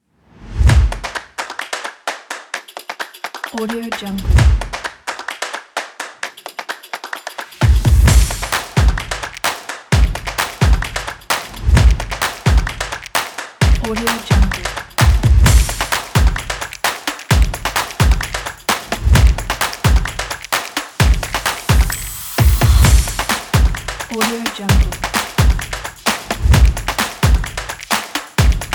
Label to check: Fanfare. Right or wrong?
Wrong